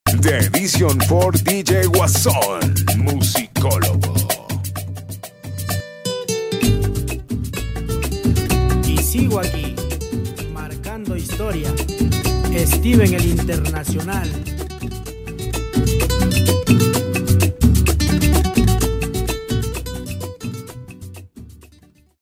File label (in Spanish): Bomba 128 BPM